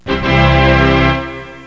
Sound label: tada